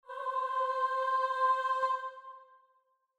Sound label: starting note, voice; a musical march